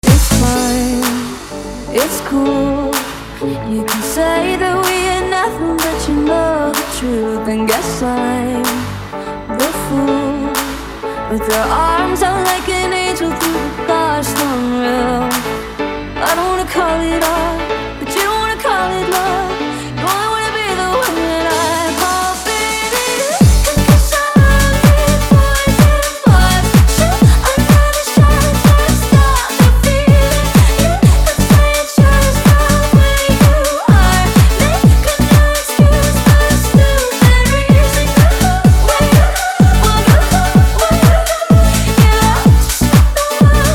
Club Party Banger

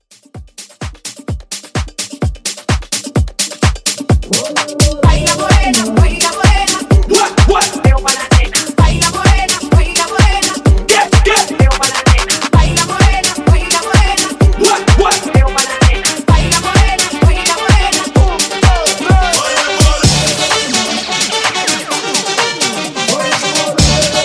House 128; Mixshow Edit

